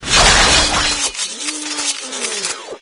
melee attack no vo; doom3; sabaoth

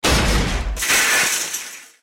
tunneler emerge; sporecarrier; npc

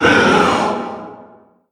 vox atk

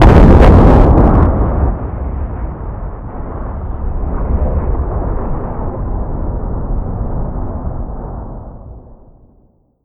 nuke far; snds jack gmod

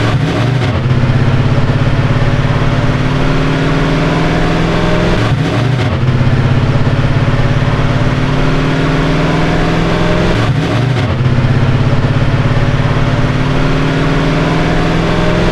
second, limousine; vehicles; sligwolf

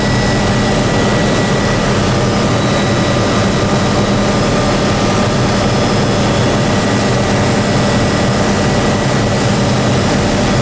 heli loop int; wac

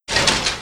movement

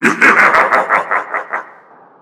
horse attack special

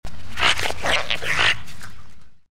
gecko vox; npc

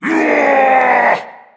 shout long

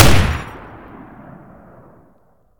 turret shoot close

